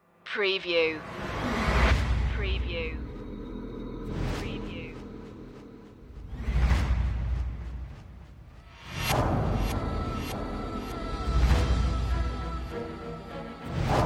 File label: no choir